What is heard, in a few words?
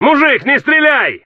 Panic, Human, Fight; customnpcs